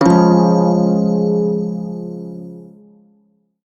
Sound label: phrygian chord, shawzin; F; Warframe